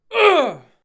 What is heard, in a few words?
Grunt